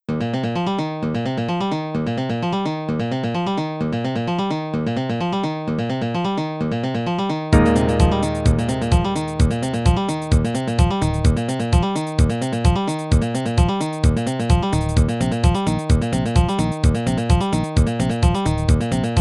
one guitar part and loops it; weekly beats 2020

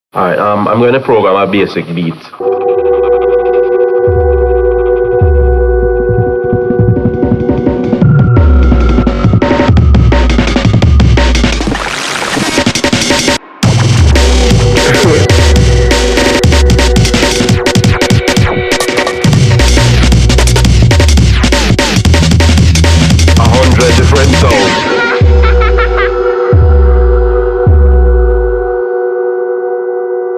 171 bpm untrained drumma; weeklybeats 2026

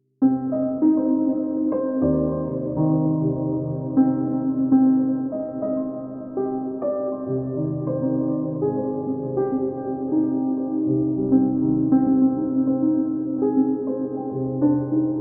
arp

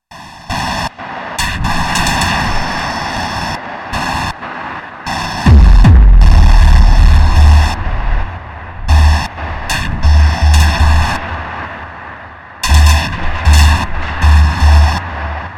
noise filth beat

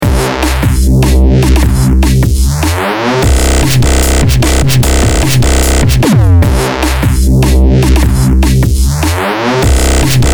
riffs and sound designs; weeklybeats-2020

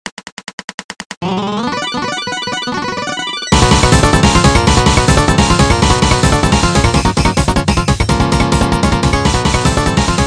wall of noise test; weeklybeats-2016

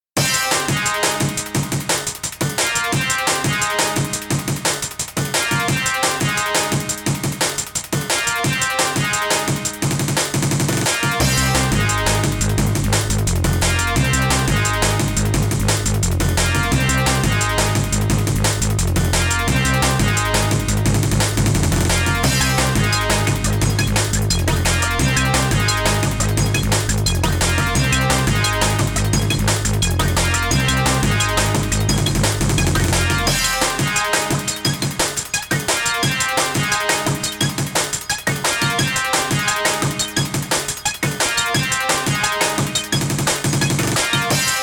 m8 dnb

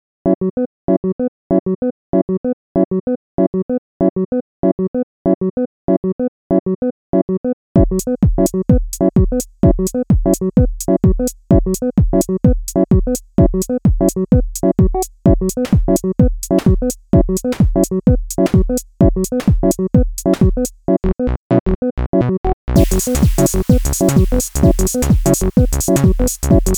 techno; weeklybeats-2020

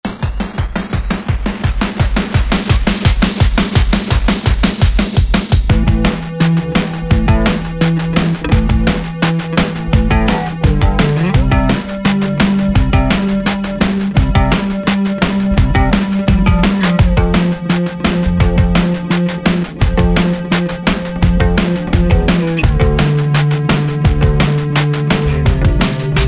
bass and synth thing; weeklybeats 2026

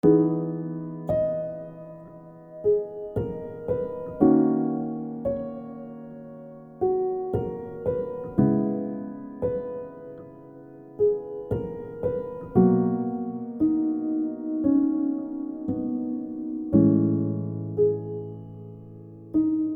piano; weeklybeats 2024

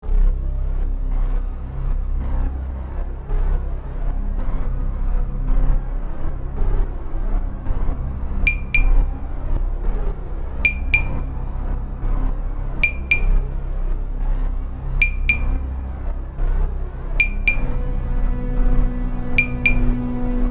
click click

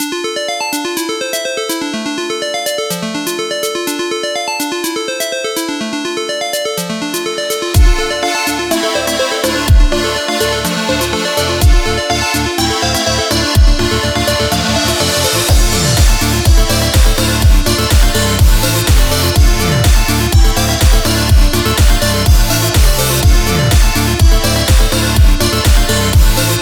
slide bass

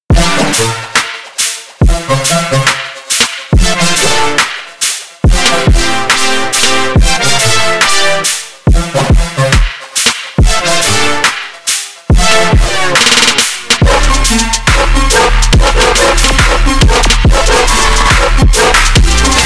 screeeeech; weeklybeats 2024

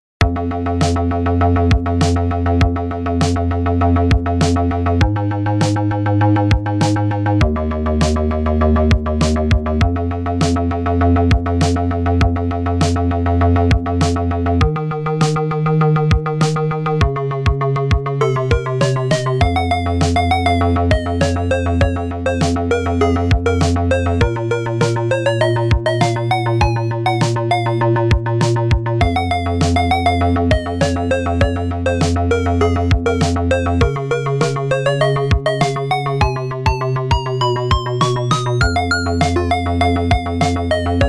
quick chippy beat; weeklybeats 2026

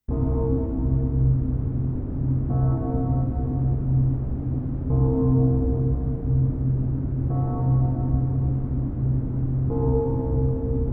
space station ambience

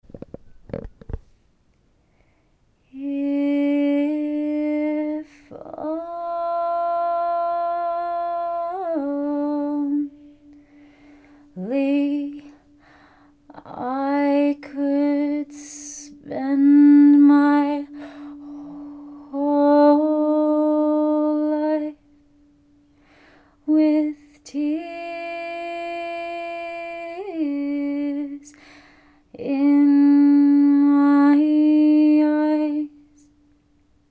budgie with bouzouki resonance; weeklybeats 2012

